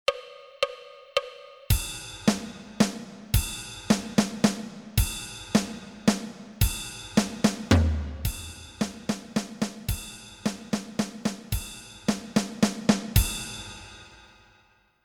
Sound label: Waltz 110